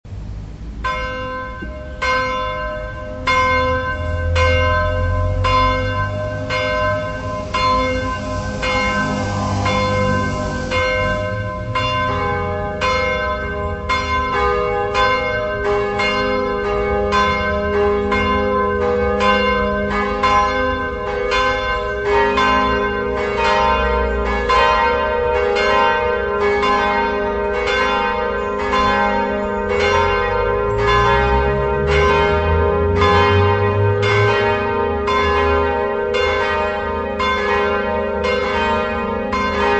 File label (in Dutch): driegelui nieuw, luidklokken; sintfranciscuskerk; klokken en uurwerk